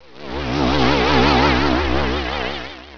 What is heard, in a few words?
drown; quake2; battlebrowzer